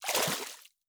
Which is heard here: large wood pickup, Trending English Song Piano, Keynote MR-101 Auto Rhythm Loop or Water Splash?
Water Splash